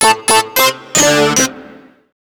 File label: Funky Riff, Keyboards